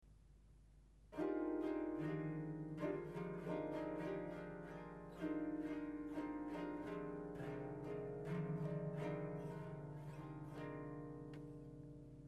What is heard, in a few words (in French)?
chif boule, touchers de la harpe